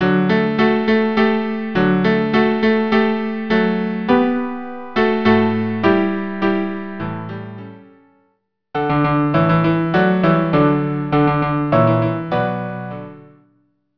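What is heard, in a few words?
cello piano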